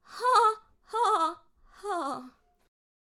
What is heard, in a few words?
Death Vocals